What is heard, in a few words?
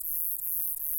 song castanea, katydids neduba